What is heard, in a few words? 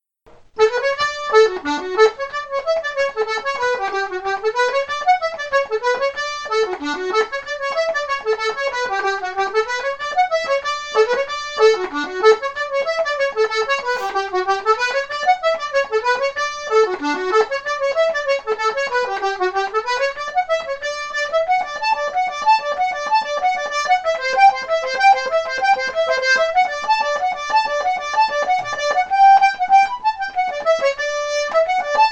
reel accordion; mooncoin reel; tune tutor; SeamusGrantFestival